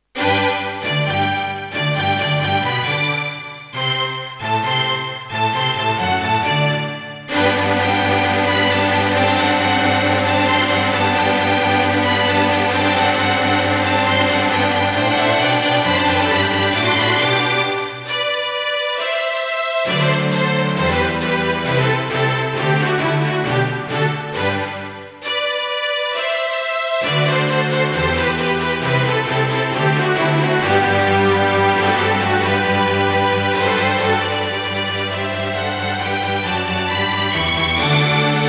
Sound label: Korg Audio Gallery AG-10; classical; Mozart; Eine Kleine Nachtmusik partial